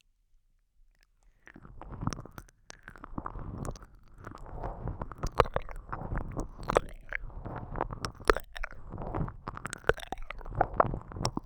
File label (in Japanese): 耳舐めのみ（耳舐めかき）